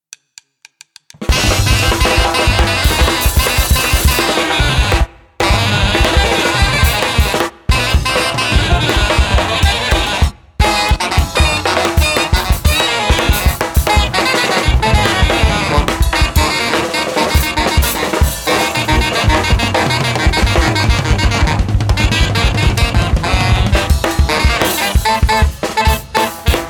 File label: SAX Improvisation